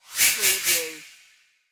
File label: Whoosh Hit Three Times Fast